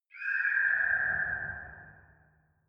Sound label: ghost birds